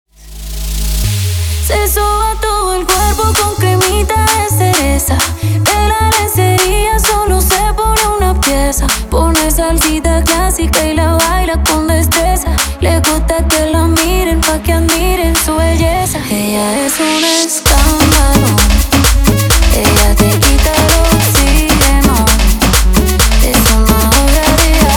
intro outro 130 bpm

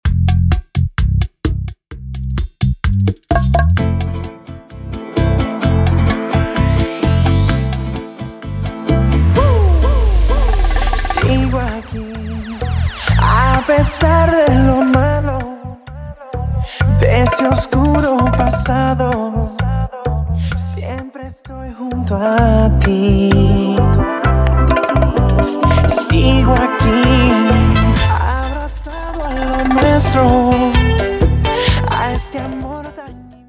Intro Outro 129 BPM

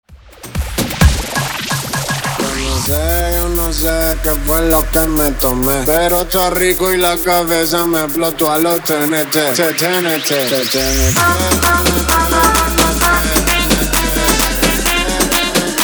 intro outro 130 bpm

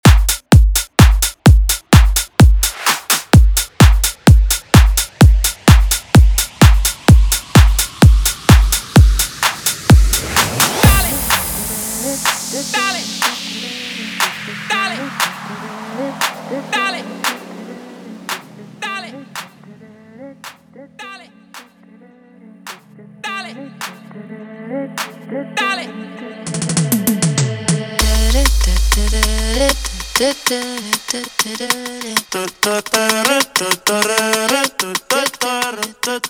Electro Reggaeton 128 97 BPM; Latin beats; Transition EDM to Reggaeton

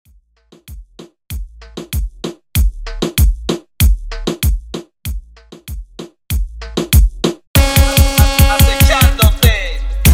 Intro Break 96 BPM

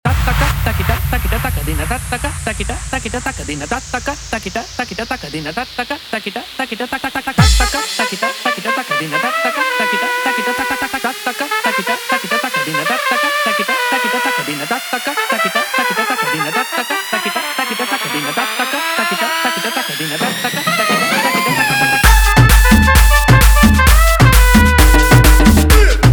guaracha remix 131 bpm

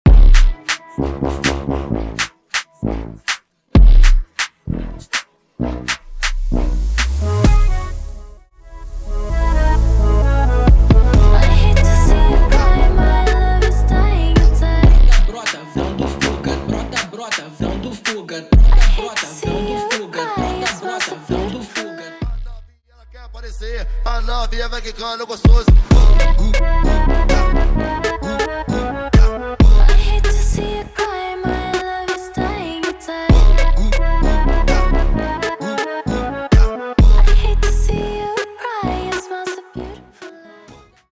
Funk Brasilero Intro Outro 130 BPM; Latin and electronic genres

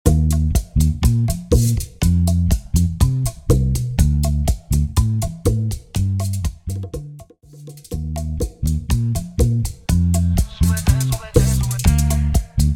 Bachata Project 122 BPM

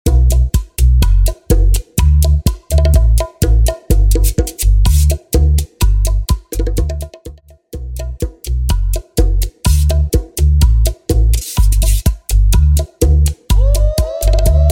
Bachata 125BPM; Bachata Project; bachata bootleg remix

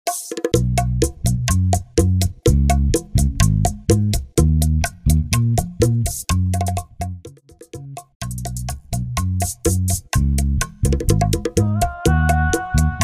Trans Bachata To Trap 125BPM; transition bachata to trap

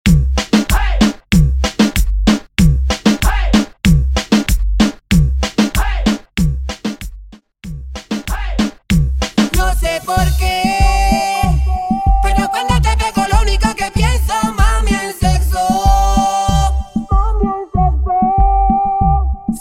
Choca Marroneo Version 95BPM

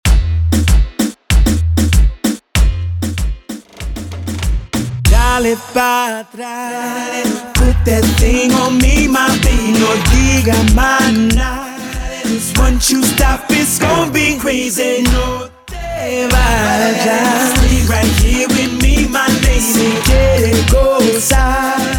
Intro Outro 96 BPM

